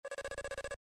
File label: text animation loop